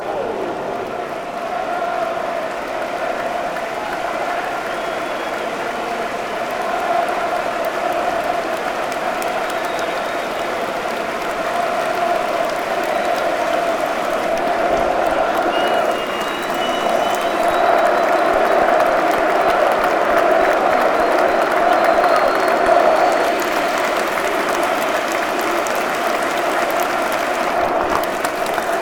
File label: minutes applause; gary speed